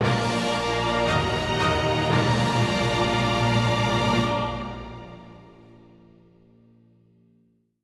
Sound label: Victory Stinger; little big planet playstation vita